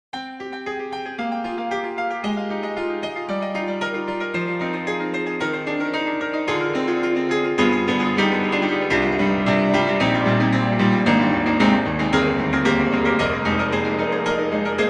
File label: Dub King; Steinberg; HALion 6